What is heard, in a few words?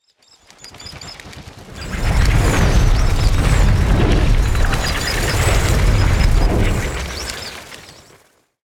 Bats Flying